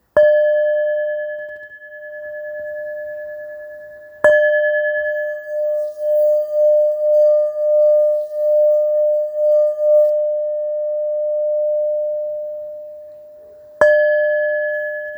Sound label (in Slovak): 520g, tibetska misa 520g